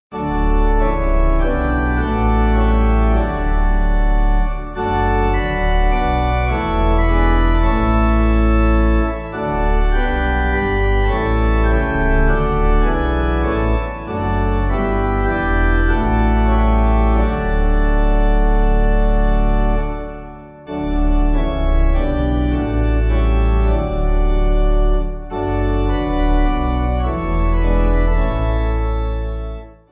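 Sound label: Pipe, hymn backing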